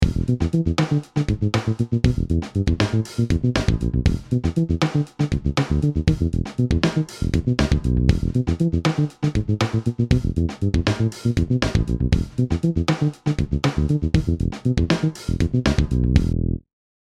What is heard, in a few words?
Bass Taurus